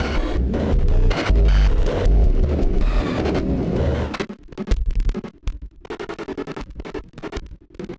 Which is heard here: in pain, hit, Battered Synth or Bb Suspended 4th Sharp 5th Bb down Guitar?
Battered Synth